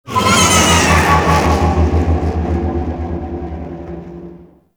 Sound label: Fly By Quick; Vehicles; Warframe; Liset; Liset Flight; Nora Liset